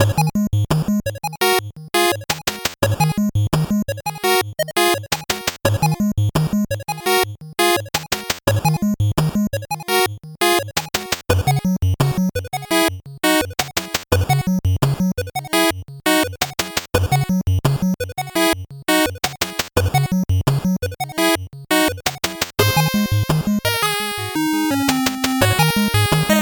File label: 8bit bgm slow